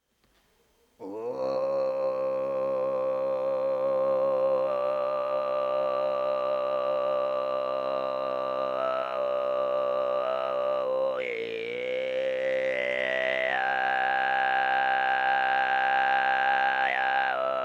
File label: Steppe Kargiraa